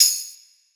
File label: Tambo; Percs